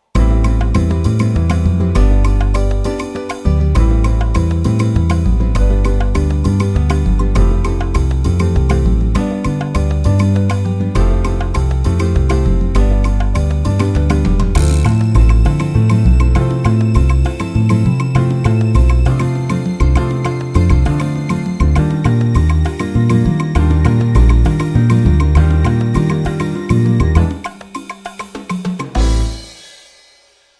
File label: 3-4 latin